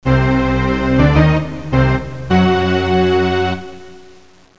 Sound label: Sinister